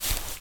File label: Footstep - Soft Sole On Dry Grass; Frogsong